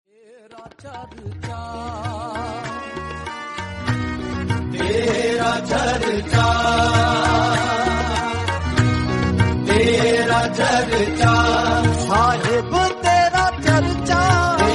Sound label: Hindi